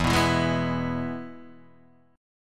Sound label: E Major E down Guitar Open D